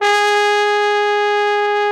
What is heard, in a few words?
TROMBONE; Best Service Gigapack 1